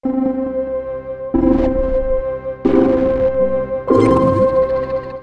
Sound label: Race Start; Oh Deer Alpha